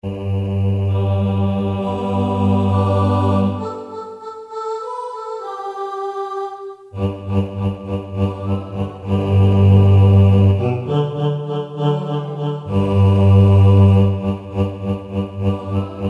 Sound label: BASS